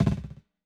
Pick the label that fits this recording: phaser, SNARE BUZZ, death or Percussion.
SNARE BUZZ